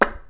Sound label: Funny cartoon splat